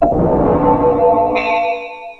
ELEC; East West - Global Sounds; SPATIAL

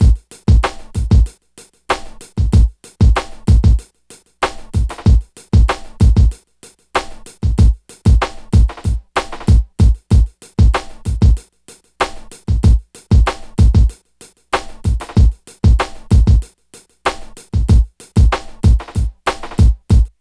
DRM, Hip Hop and R&B; Best Service ProSamples vol.02; AKAI

